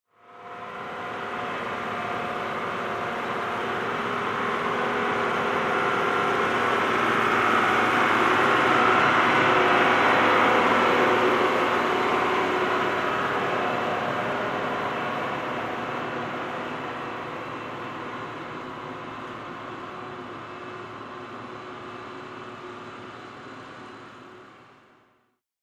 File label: Construction Machinery Sound